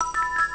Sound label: Credit SFX; 11e Rallye; Big Run: The Supreme 4WD Challenge; arcade gamerip 1989